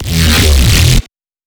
Neuro, bass; Old Billain

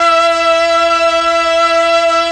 STR, STRING; ILIO; Propeller Island Legendary M400

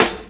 SD, Dance Drums

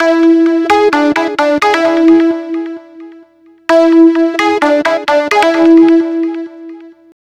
NRG Lead, trance; Sonic Foundry (Sony Creative Software); Trance NRG VOL-1

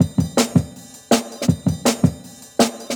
162, Jungle Breaks; Original Jungle Breaks

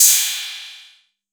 PERC 808

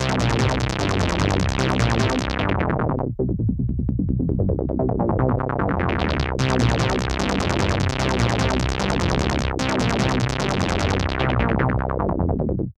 FILTER MOOG, BASSLINES 150; Masterbits - Tekkno Trax

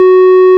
ORG, Farfisa Clarinet

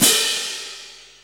PIATTI LO, CYMBALS

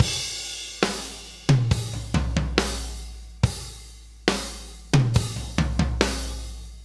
Loop, Drum Tools, Laid Back

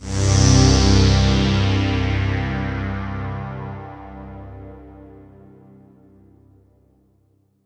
Roland JV 2080, interval; MG INTERVAL

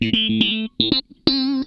FAZE PLUK, Funk Guitar; 90 s Sample CDs; Zero G

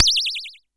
Pollard Syndrum

